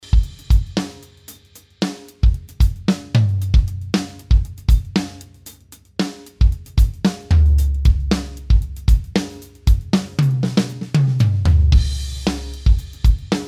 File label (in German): Drums mit Roommics; X32 Drumrec Mix-Evo